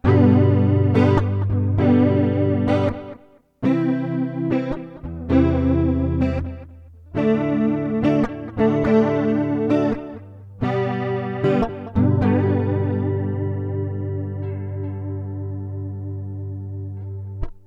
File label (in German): iZotope Spire Studio E Gitarre mit Pedaleffekt